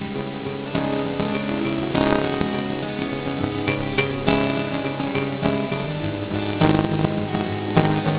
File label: musical